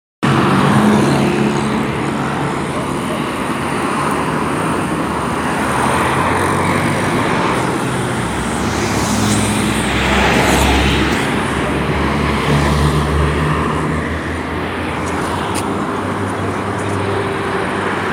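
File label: sound of road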